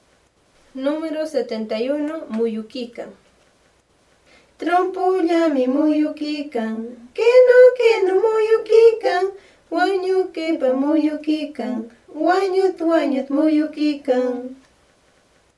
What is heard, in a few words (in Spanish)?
cancion